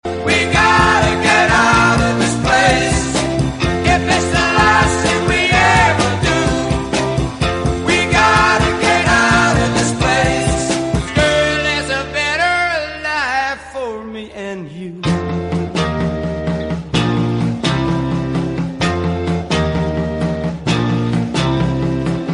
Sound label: english song ringtone